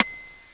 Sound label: met bel